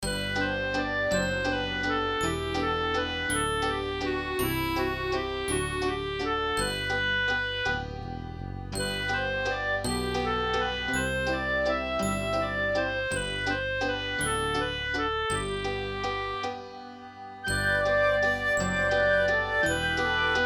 Childrens music

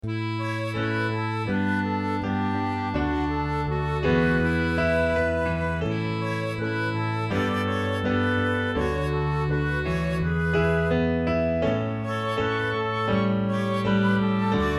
LOOP Childrens music

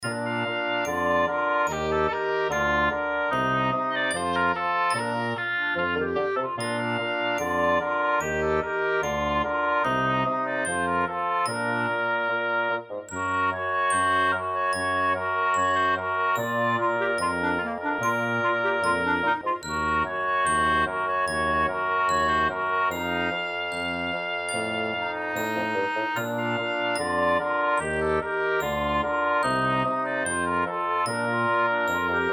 Childrens music

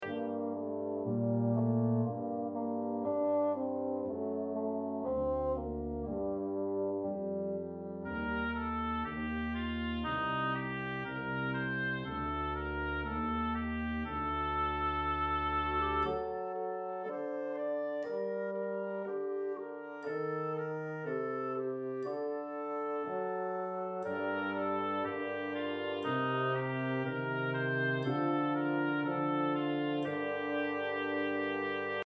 children music

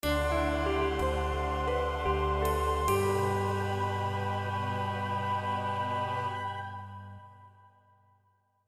Lullaby End

